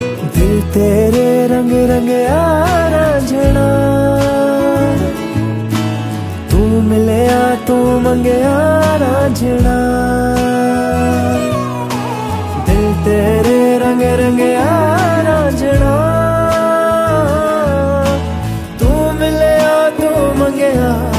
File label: Ringtone